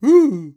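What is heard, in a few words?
monster fight; spiritheroes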